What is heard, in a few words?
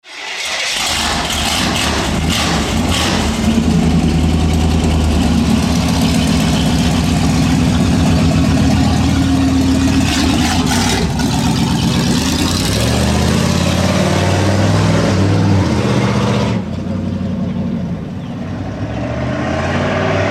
my truck